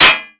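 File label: ricochet metal; firearms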